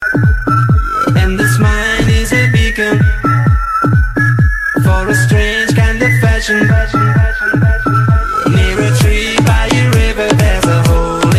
tribal ringtone

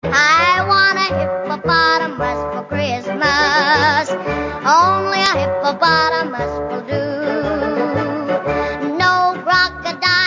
funny tone

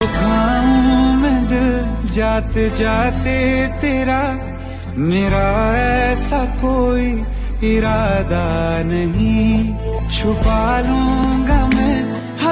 sad hindi ringtone; emran hasmi; bollywood ringtones

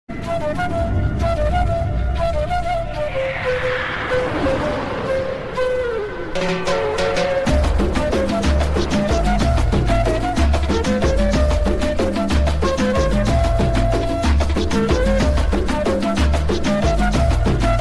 flute ringtone

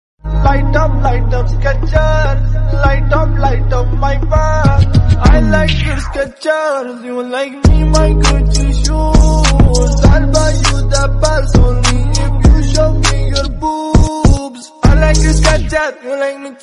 refreshing song tone ringtone

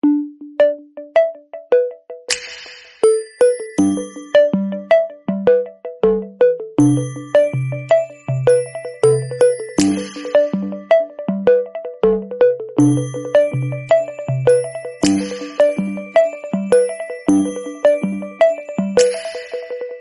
incoming call tune ringtone; samsung

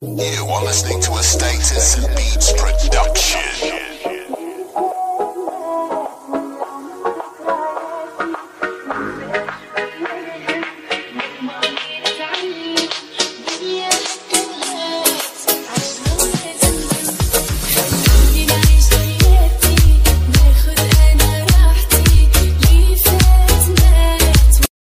new arabic ringtone, funny

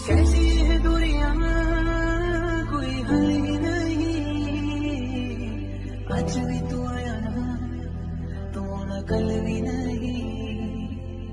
ringtone, bollywood ringtones

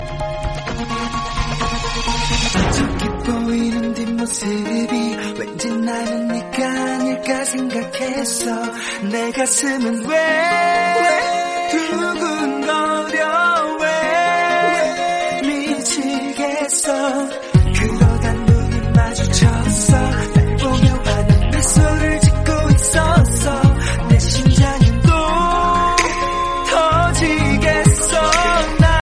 refreshing famous song mobile tone ringtone; samsung ringtones

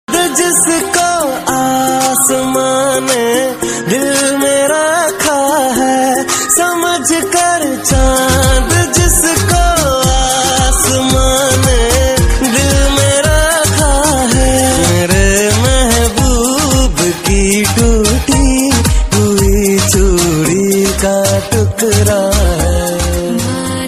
sad music ringtone

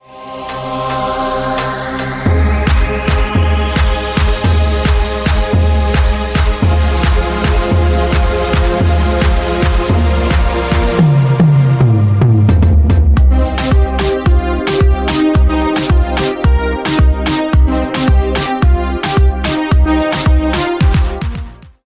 boom again ringtone

